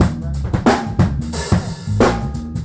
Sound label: jungle fiver, funky drum loops